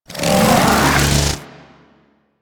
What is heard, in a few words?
Bow Charge, Weapons; Warframe; Corpus